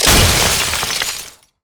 ring of frost; LoL; lissandra

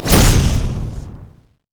twinbite human; LoL; shyvana

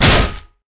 basic attacks; LoL; vi

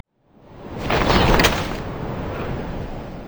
run; LoL; janna forecast

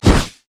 lich wall of pain; karthus

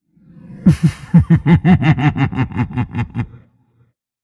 laugh; dandychogath; Character Voices - English

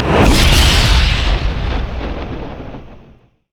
spectral; LoL; yorick

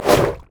basic attacks; Ziggs; poolparty

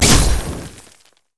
basicattack; Yasuo

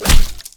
basic attack; LoL; graves

